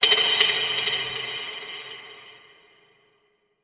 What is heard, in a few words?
Sting Dropped Pipe